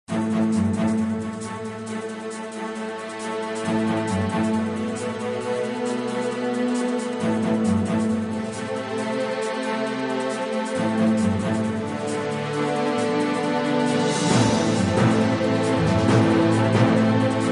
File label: bgm